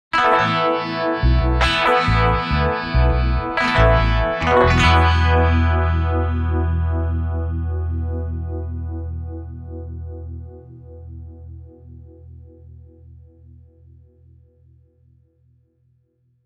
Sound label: Rickenbaker solo; La Facture 2016 TRA-4aR; effectrode